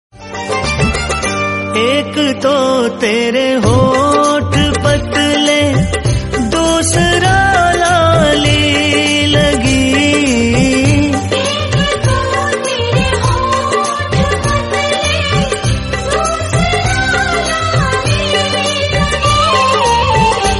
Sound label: BHAJAN RINGTONE